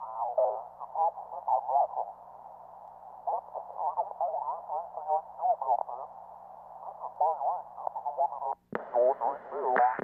Futz Radio: Radio Static And Warble With Pointed Lines. Mono